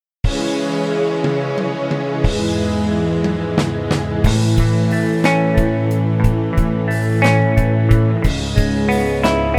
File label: Rock Tracks